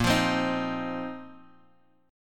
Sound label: A sharp Suspended 2nd Suspended 4th A sharp, Bass Standard; strummed; A#sus2sus4; JGuitar